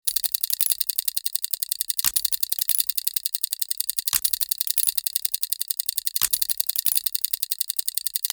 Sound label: Small industrial machine loop